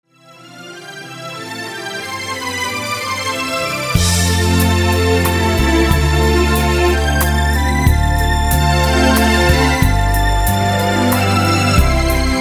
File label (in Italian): Valzer Lento Strumentale